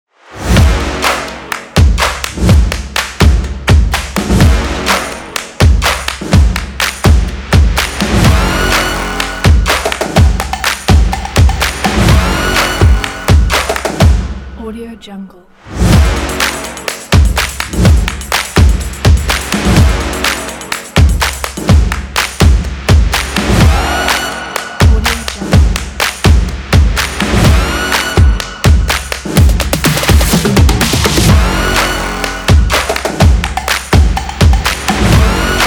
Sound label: clap percussion stomp energy action; colorfilmmusic